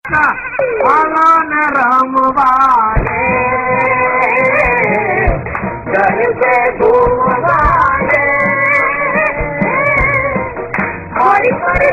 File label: Garba; Tarak Mehta